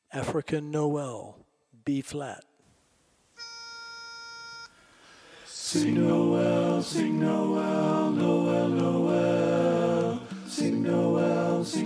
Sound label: African Noel Lo Tenor, Learning Tracks